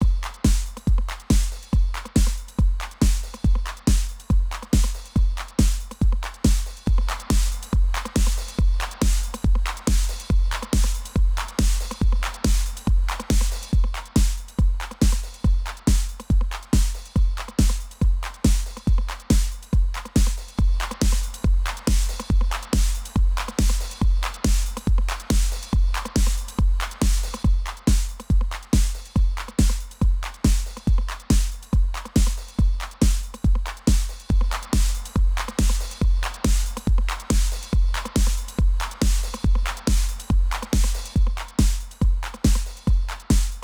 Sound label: att Fast, rel 200, Soft, Flat (16dB gr)